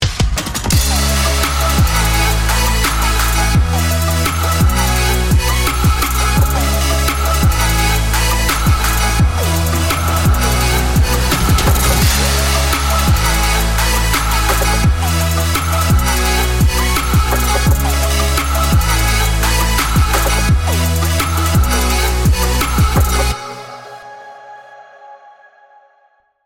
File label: upbeat future bass